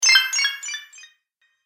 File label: Magic Purchase Delay Ding